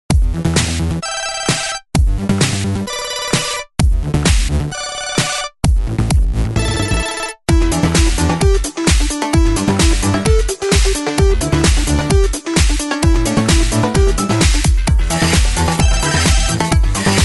ringing to you; Samsung; GT-C3222

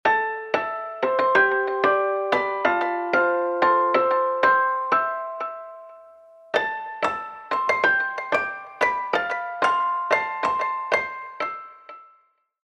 Fixing Ringtone, Fixed Piano; HTC

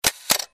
photoshot; Huawei U5760

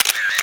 Shutter; Motorola V9m; Camera

